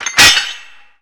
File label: Metal Heavy Impact; Impacts & Destruction Sweeteners; Sony Pictures Sound Effects Series Volumes 1-10; Sony Creative Software